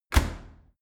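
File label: dry cleaner close hard, maschinen türen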